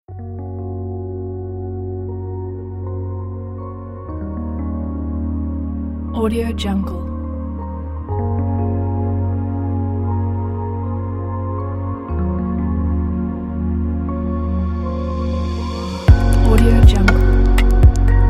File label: oceans